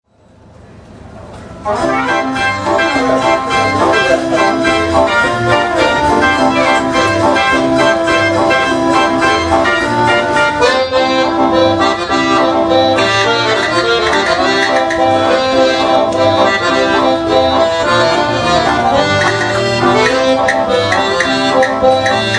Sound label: Accordion